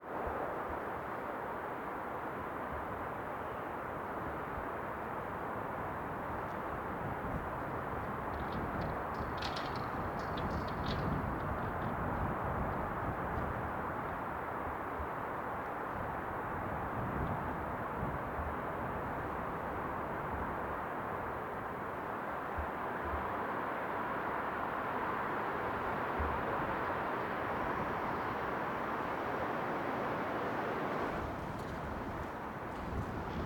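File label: Wind, Exterior, Alley, Whoosh, Fluctuating slightly, Wire flapping; Hzandbits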